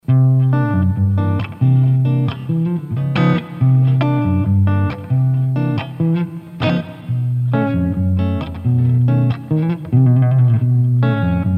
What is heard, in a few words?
clean 15 gain; JFET